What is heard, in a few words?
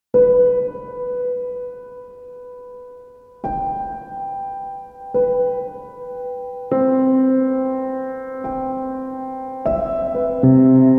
Piano Sounds